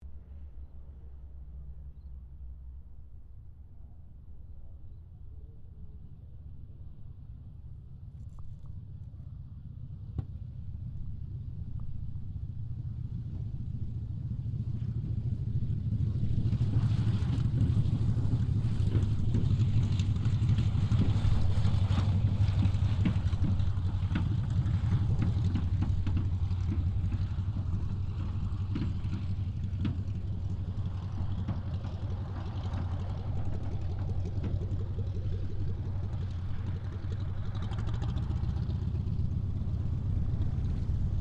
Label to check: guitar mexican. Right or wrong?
Wrong